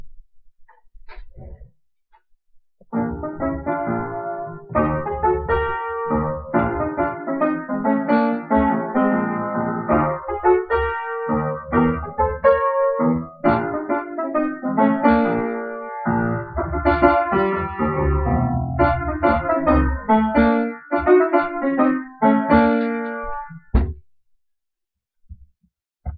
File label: honkytonk faster